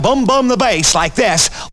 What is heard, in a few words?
VOX GENERAL; Megabass Remix! 2